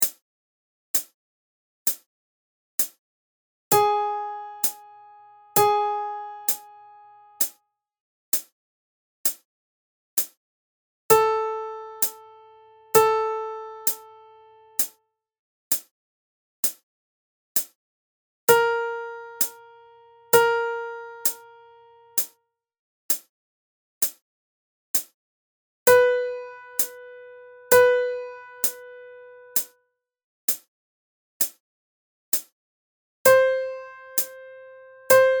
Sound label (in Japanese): 裏声練習フレーズ Women